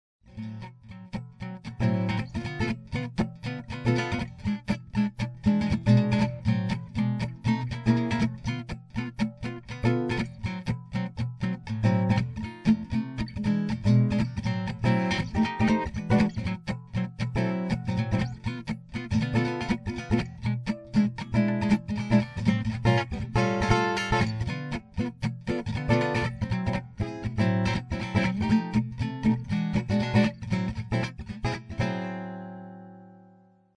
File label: rhythm; Gitane